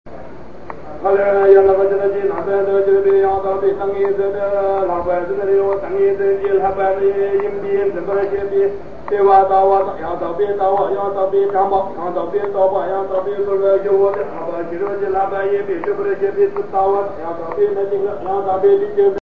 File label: monk practicing; india; The sounds of monks practicing for tomorrow's prayers is echoing through he monastery